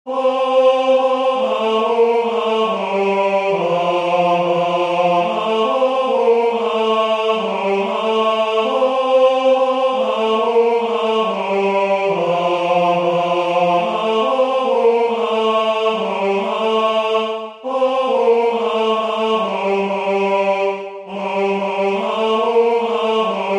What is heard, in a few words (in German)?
Es ist ein Ros entsprungen Tenor